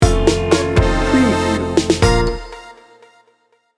80s pop r&b sting bumper

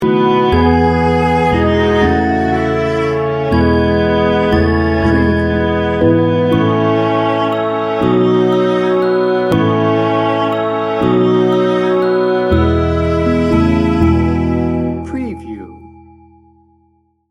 it came upon a midnight clear

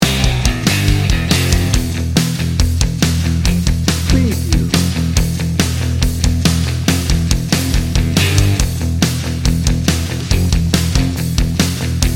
macho rock

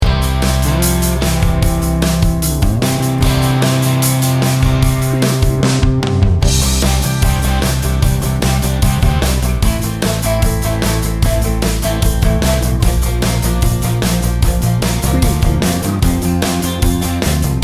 classic corporate rock bed

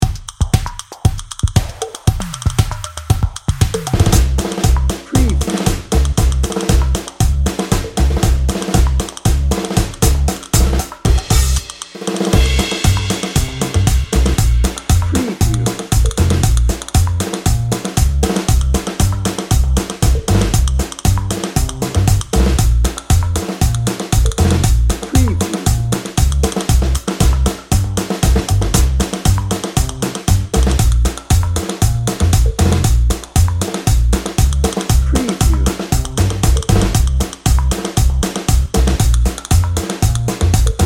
paso doble dancer dnb

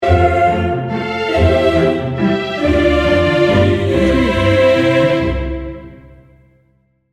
morning song sting bumper

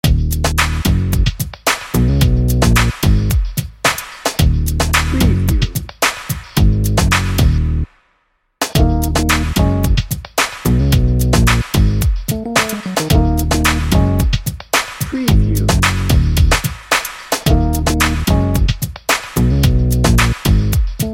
cool funk bed

